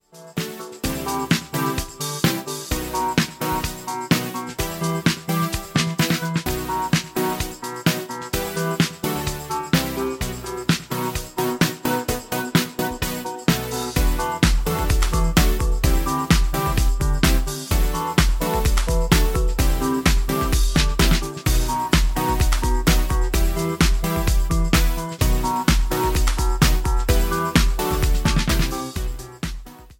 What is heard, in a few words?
Warmth Beats; MIUI12